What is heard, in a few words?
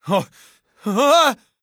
panic; mgsv